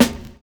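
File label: BOND bass drum; Univers Sons - Kitch 70s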